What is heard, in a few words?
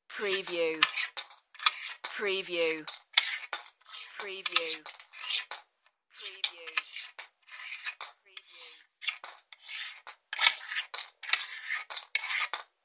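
Counting Coins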